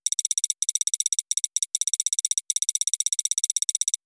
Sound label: typing